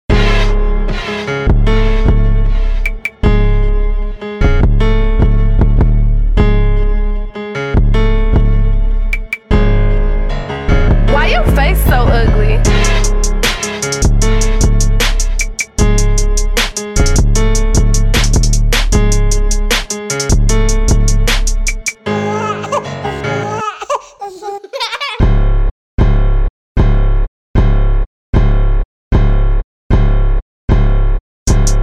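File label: raw instrumental, 77 bpm, A flat minor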